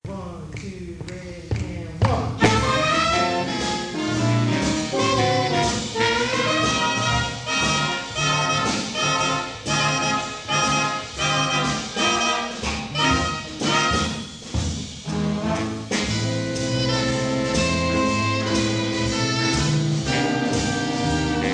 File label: Jazz; Juan Garcia Music